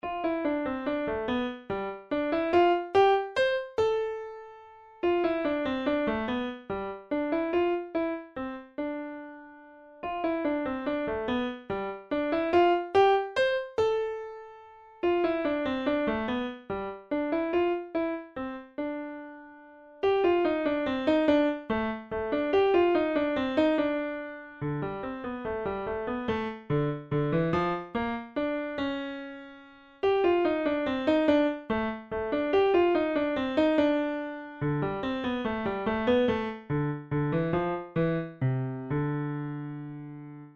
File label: Piano